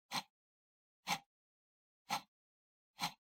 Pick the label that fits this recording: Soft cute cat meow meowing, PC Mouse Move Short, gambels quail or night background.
PC Mouse Move Short